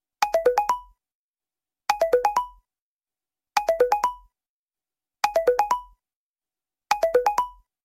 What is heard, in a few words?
iphone ringtone